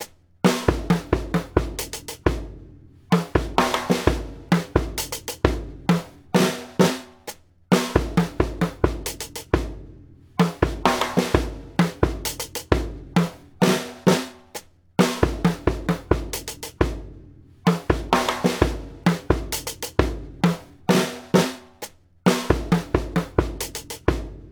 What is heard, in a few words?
MOUNTAIN POND LOOP, drum loops